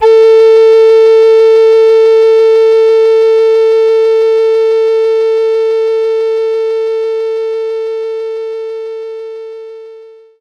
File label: Recorder A4